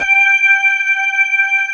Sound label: B3 HAM, Keyboards Old School